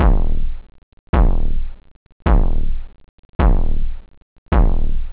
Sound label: hard, drums techno; OctaMED SoundStudio V1